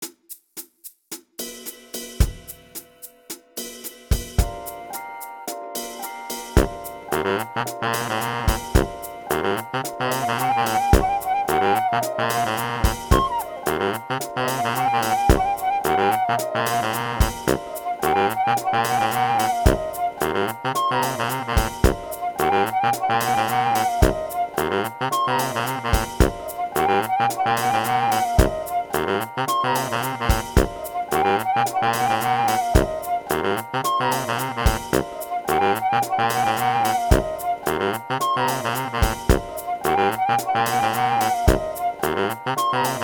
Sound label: Jazz tune